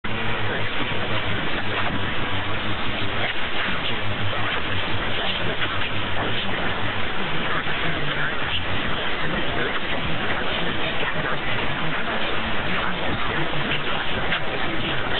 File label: Radio Coran Algeria